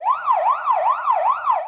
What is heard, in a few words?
Siren; blue archive